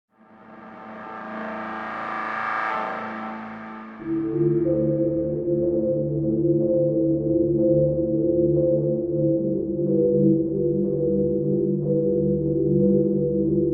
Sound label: shady ambience